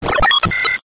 science fiction console error feedback tone; zapsplat

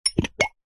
household ceramic ramekins x2 pull apart seperate

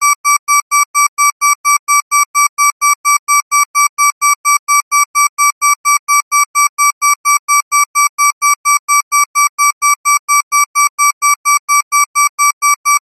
emergency alarm siren small digital beeps fast

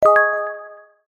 multimedia notification mallet synth dreamy

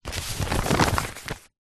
foley paper sheet a4 fold in half

